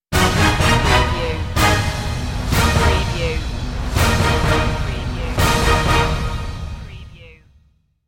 sting no choir

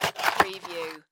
Box Open Screws; sound-effects-bible

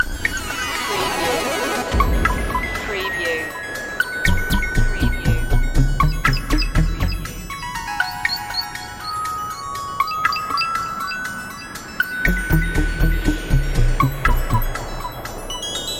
retro video game tomorrowland loop

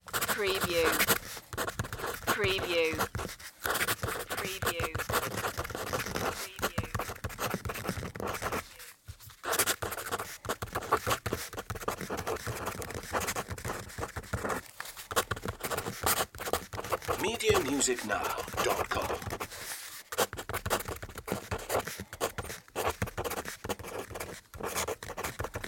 fast writing

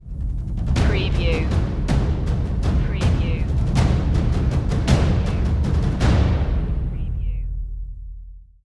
jungle ritual stinger

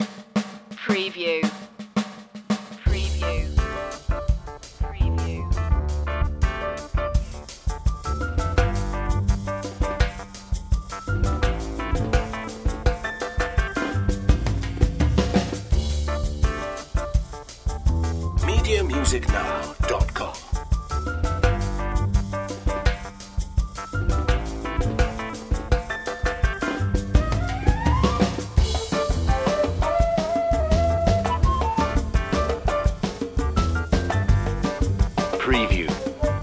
brazil bossa

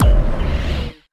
Hi-tech Panel Servo Button

